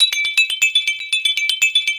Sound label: Synth